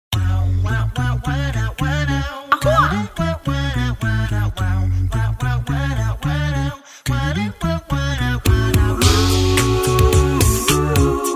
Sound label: Acappella Group; Washington DC